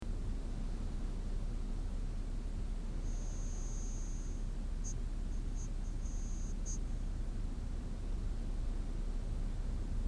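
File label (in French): ambience intérieur; deadmaze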